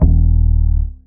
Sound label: bass stab